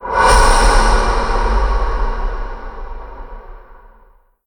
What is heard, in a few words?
Sparky Flare; moul-assets